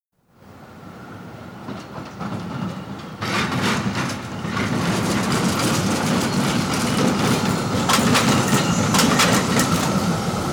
streetcar

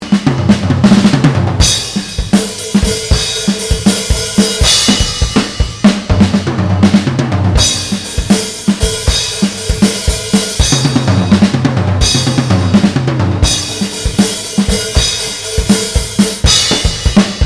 drumming

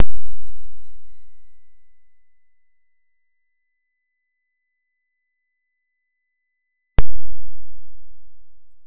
Beamship; AEG

